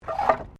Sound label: Wood Move